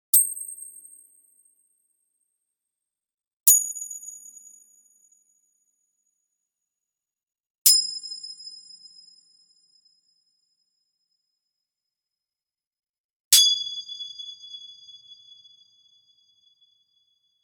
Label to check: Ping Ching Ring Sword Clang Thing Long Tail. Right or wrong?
Right